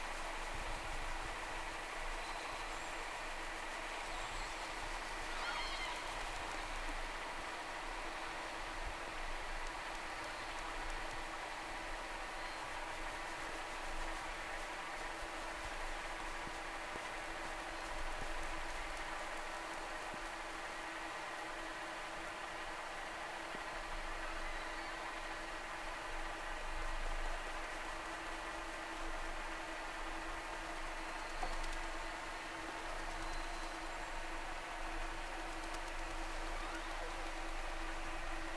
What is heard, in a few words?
Whales; SeaSnake